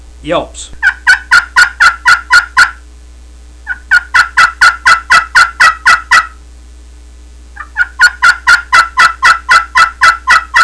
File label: slate walnut yelps, turkey hunting